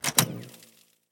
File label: power off; fallout term